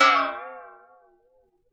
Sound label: BOX FX; Univers Sons - Raricussions